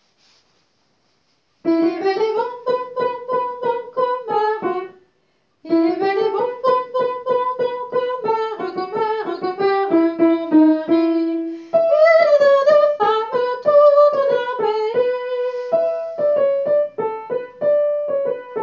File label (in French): Bel et bon soprano